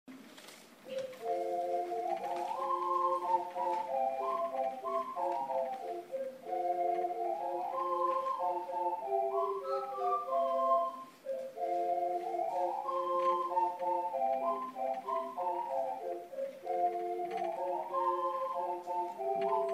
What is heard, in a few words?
ocarina community